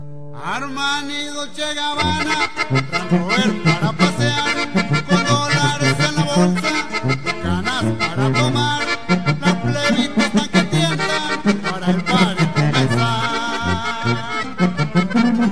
corrido; Latin

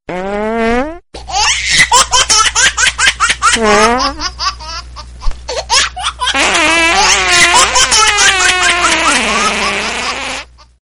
Peito de Bebe y Risa

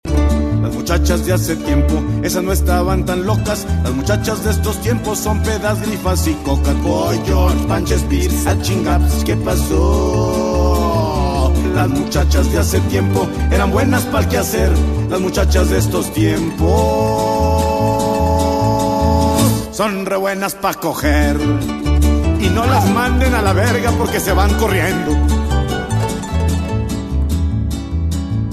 corrido de la mujer; Latin

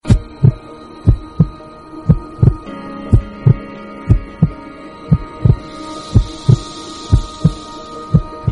latidos de corazon

